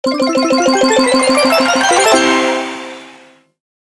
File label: win